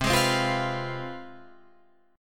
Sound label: C Major 7th Suspended 4th Sharp 5th, C, down, Guitar, Open F; CM7sus4#5; strummed